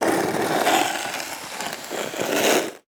Weather Snow Shovel Scoop Heavy Snow On Cement Long